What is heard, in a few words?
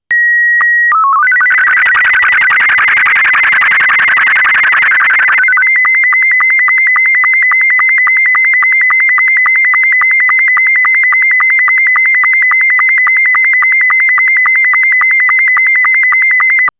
SSTV